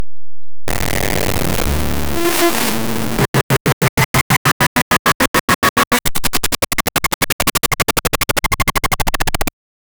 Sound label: ruined toy 1 sec glitch